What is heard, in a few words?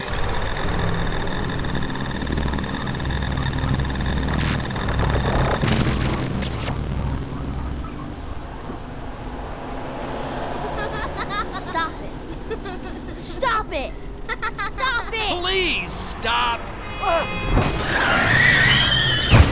Glitch Crash with effects